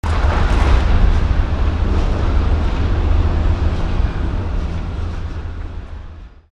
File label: machinery outdoors, harbor; Garry's Mod; tf2; cp harbor